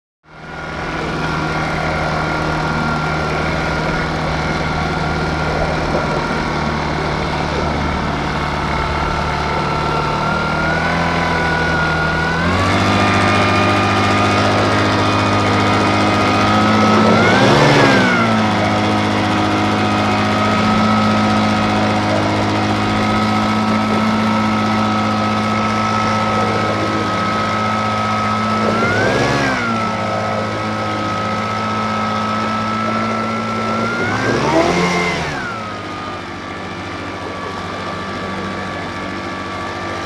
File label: Boat with Engine; Run / Stop ( Ext. ); Boat Runs And Eventually Slows And Switches Off.